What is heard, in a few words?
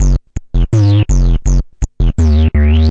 deep gate bass